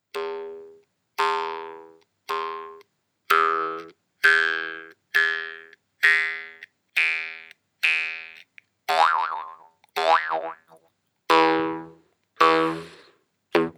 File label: kubing; Instrumentensammlung